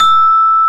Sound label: celeste E5; E-MU Formula 4000 Series Vol. 5; Protozoa; Proteus2 Presets